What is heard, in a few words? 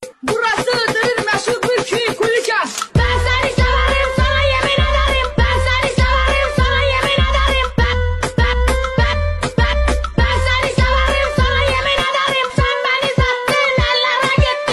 rap tiktok remix; antep